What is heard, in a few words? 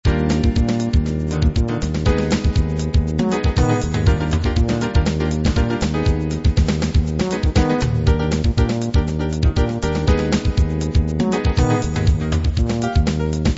latin jazz